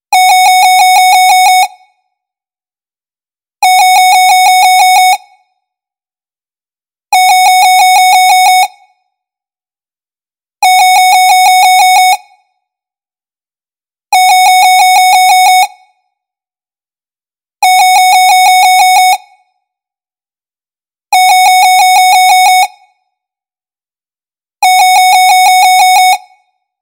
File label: Home phone